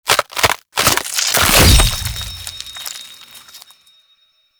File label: Archon Finisher Rip Gem; Warframe; The New War; Amar